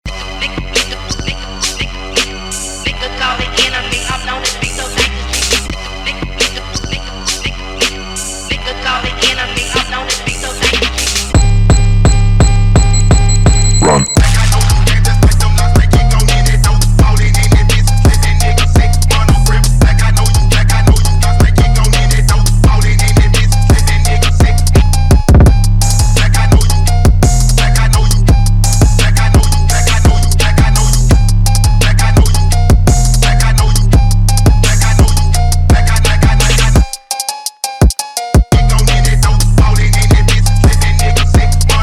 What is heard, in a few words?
PHONK